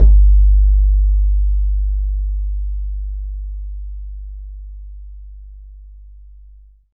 BAYAN, Drums Percussion

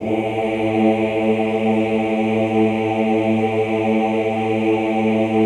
OOH A1, Classical Choir; Best Service; Extended Classical Choir

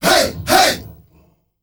"hey", world voices, afro; ILIO; Vocal Planet VOL-5; AFRO NOISE

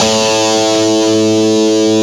E2 guitar; AKAI S-Series CD-ROM Sound Library VOL-8; SET#1 GUITAR

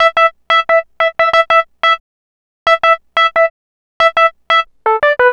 Keyboard

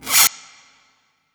Scratch Wet; Effects

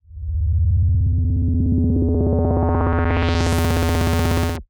Synth FX

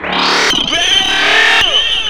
WHERE VOX; Big Beat Samples & More; AKAI; VOX FX1 KIT

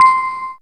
synth c, Top End Tinklers; Dance Synths

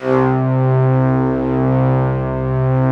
ST-ORG, STRINGORGAN, Yamaha VP-1

